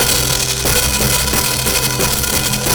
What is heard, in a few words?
hihat